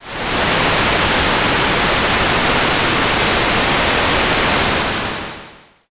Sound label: rain